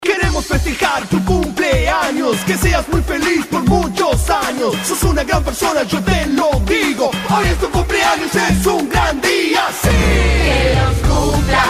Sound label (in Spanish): CUMPLEAÑO FELIZ